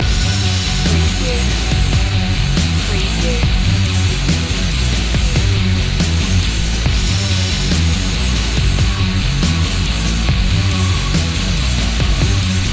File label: anvil loop; boxing